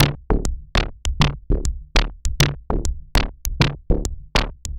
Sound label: perc 100 solid, Percussive Loops 100 bpm; Sample Magic - Transmission-X